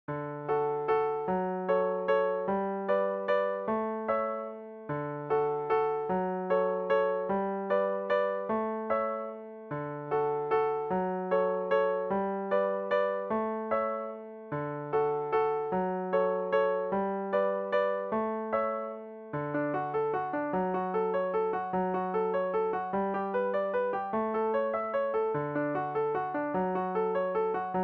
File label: valsegic piano